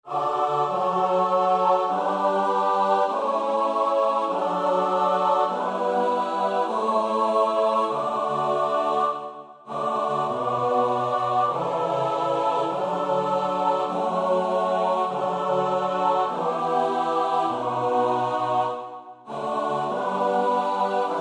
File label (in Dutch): S-A-T-B; Nieuw Liedboek; NLB 464a